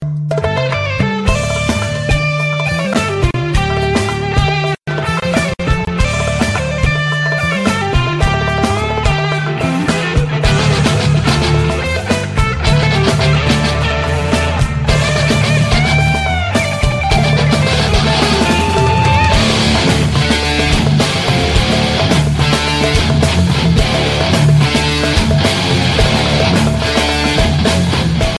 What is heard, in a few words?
arabic rock tone ringtone